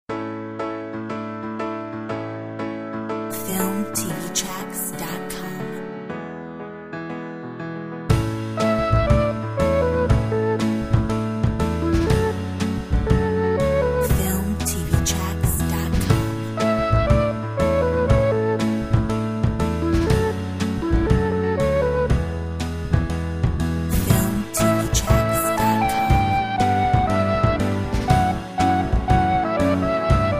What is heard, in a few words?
mellotron